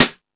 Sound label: Jazz Drums, E-MU Pro-Cussion; drum machine